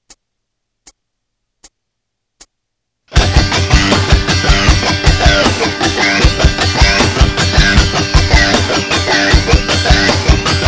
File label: scratch